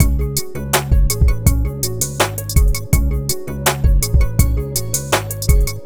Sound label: RnB; Google; NewWave Labs